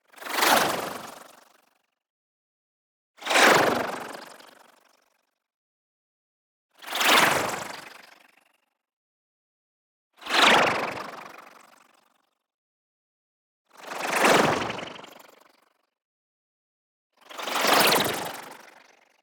NEON WHOOSH SMALL FAST Bright And Bubbly; boom library

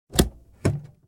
Entrance Door; blindside

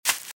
Velociraptor - Footstep - Grass - Run - Right

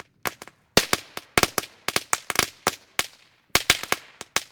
firework single; best service Blue Box

